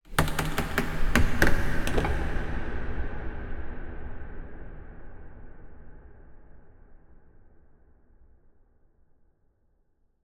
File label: sound design creak knock hit reverb wooden horror suspense cinematic